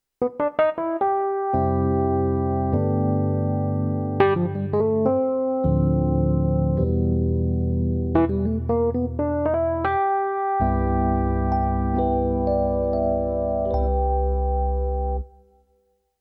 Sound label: BK9 E-Piano